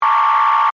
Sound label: Transmission Burst, Scifi, Transmission, Burst, Science, Fiction; Communication Sound Effects; SoundEffects-BlastWave